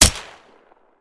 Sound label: weapon; Agent Mastery